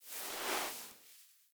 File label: Foley Idle Sit; Enemies; Kubrow; Warframe